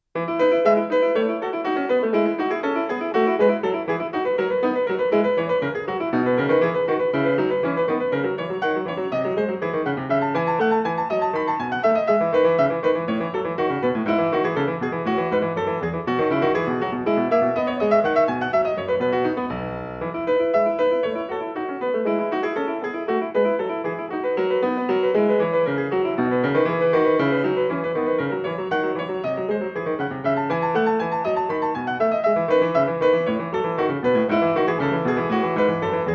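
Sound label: Praeludium E Major, piano; BWV-937; BACH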